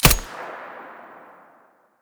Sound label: muzzle stereo, weapons; fort